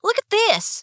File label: look